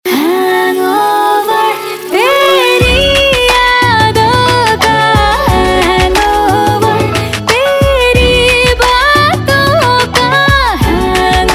Female; Bollywood; Kick

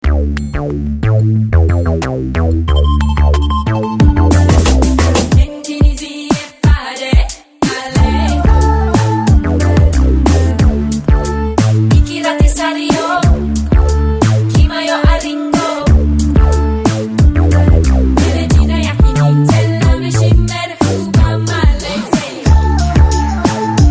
Airtel 3G Tone Street Dance; Airtel Ringtones

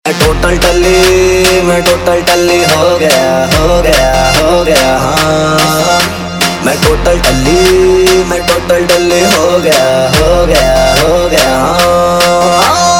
Trap Remix; Bollywood; LoveShhuda

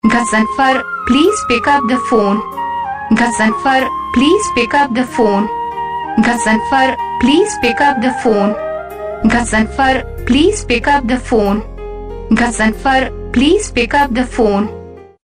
Pick Up The Phone

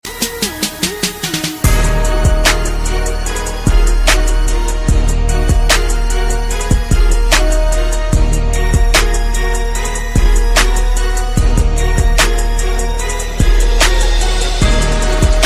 Beat Vs Hiphop Tone, Ringtone; Versus Ringtone (VS)

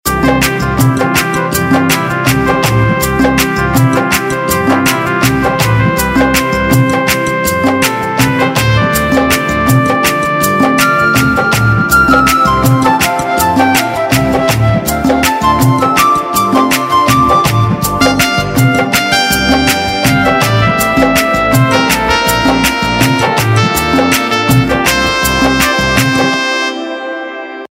Instrumental Ringtone; Bollywood Hits (2014)

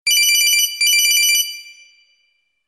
Cellphone Ring 3D

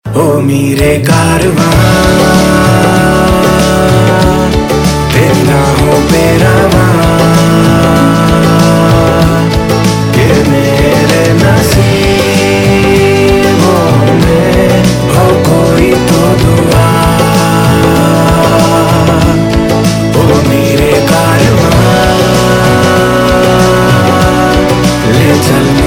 Male Ringtone; Bollywood; Lucknow Central

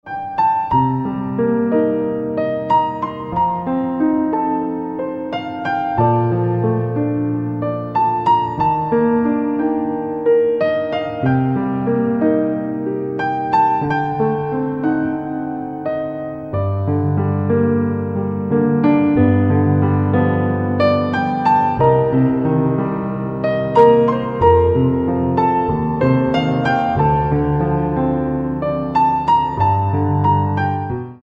Piano Ringtone

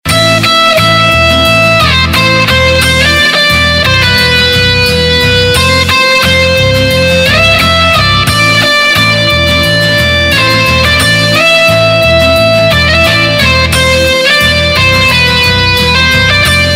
Rock Instrumental Ringtone; Bollywood Hits (2014)

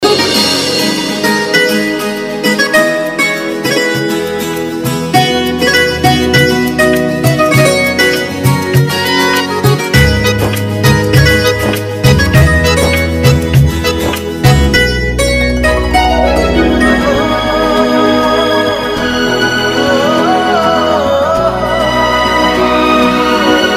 Tune - Theme Tone; TV Serial Tone; Sony; Hum Hain Na

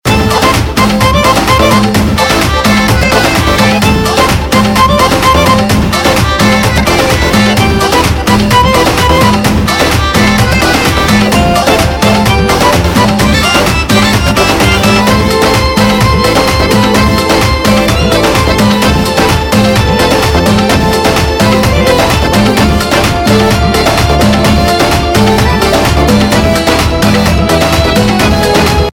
Ringtone; Bollywood; Tubelight

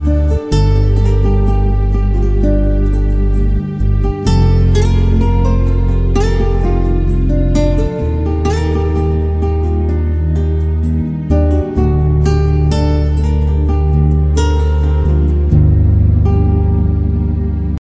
Soft Instrumental; Ringtones

